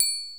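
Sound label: triangle; Alesis HR16A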